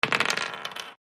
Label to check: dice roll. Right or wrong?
Right